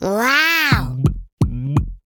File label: China Miss Sound; Jolly Battle; Android